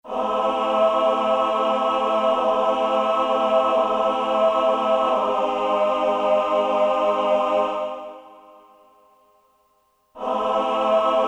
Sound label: Choir, Korg DSS-1; Voices II